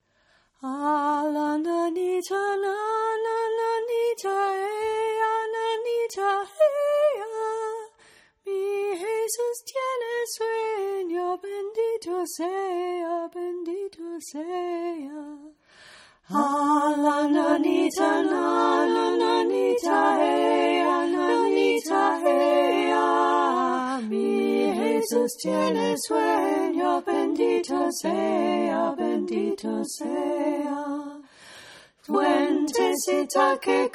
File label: choir